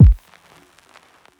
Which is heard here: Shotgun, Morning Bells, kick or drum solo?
kick